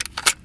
sten reload clipout

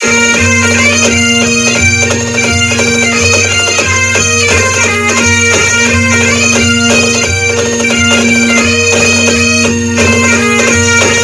win; brits; tobruk

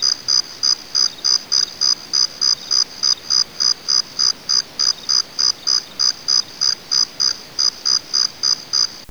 cricket ambience

